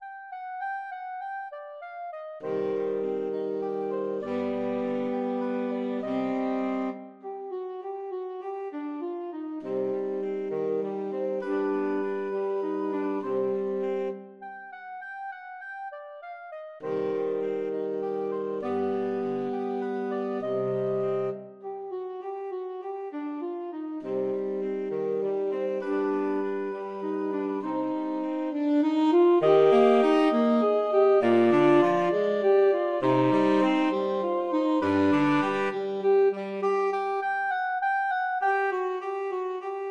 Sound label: fur elise SAX quartet